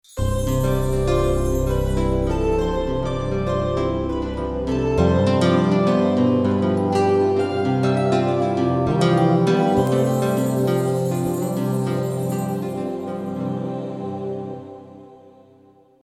片头音乐

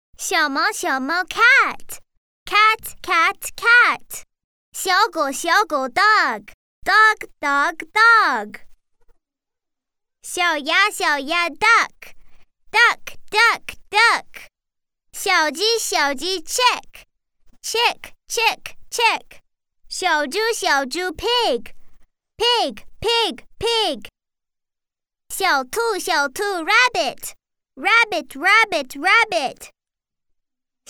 外籍女英 外语 美式英语 说唱

